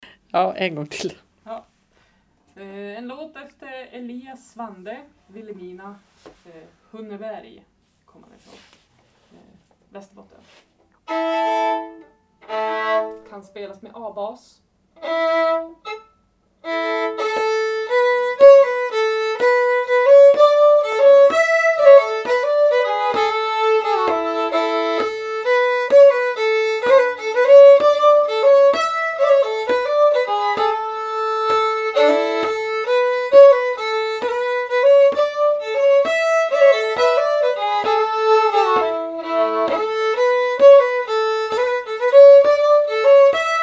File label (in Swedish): Schottis; Elias Svante